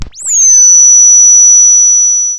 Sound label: Zx Pulse; Zero-G; Soundclash Nu Dance Electro; Acidized Wavs; FX Atmospheres